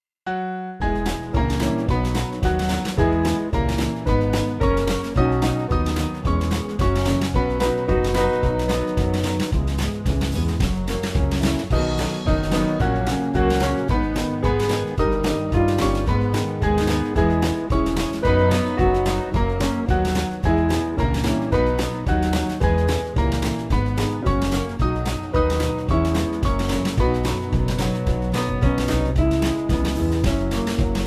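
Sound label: Band, Music Backing Files for Churches; Come Let Us Join; Nativity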